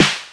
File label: Jomox Snare; techno drum fx; Analogue DrumHits